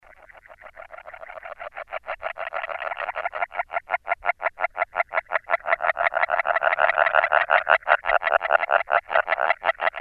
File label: Rana dalmatina; BIOAC AMPH